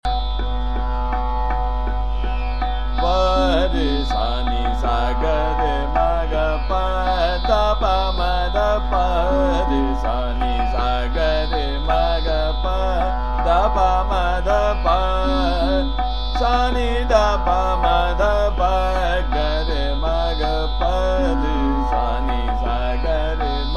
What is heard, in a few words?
Sargam P R S N S G R M G Trital, Gaud Sarang